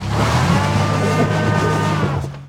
Auto Tires Peelout Ice; Automobiles

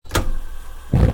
stove ON; DeadFright

